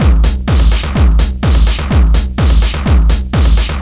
BD Industrial

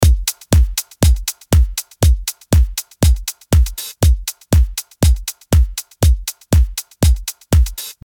1ms attack auto release 10:1 soft clip

